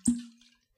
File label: Open Bottle; Drinks; DynamicEuropa; Hungry Europans